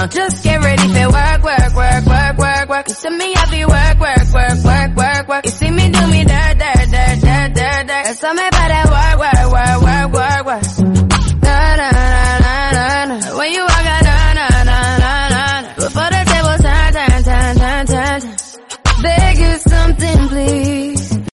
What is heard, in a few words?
Remix Ringtone